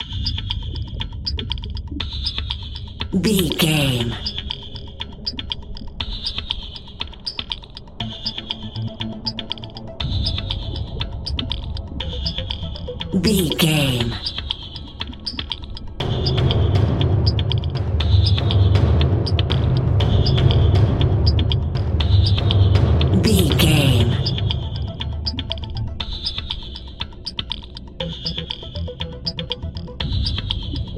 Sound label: tension and suspense music